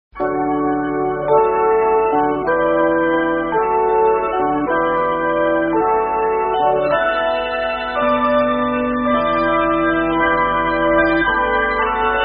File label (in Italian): organo allegro